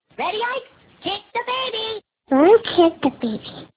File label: full kick